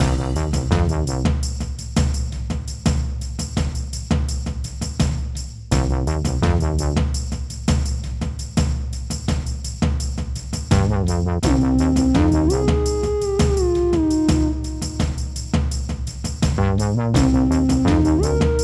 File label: Swamp Loop; Folk Hero